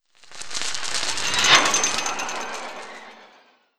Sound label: Roaster Over Tail; Legend of Keepers